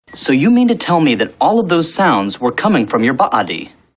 sounds coming from body; inside amy schumer

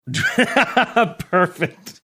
laughing saying perfect

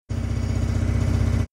jackhammering sound in background

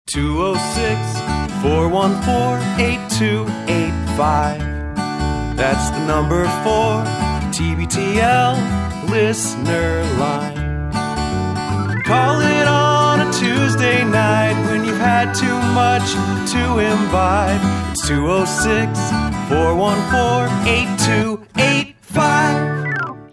voicemail line jingle

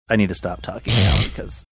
saying i need to stop talking now snorting

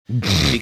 snorting

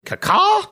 cacaw

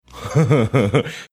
cute chuckle

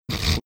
snorting

